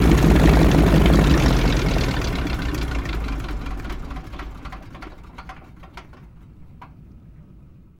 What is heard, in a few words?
Harvard Engine Off, Harvard Plane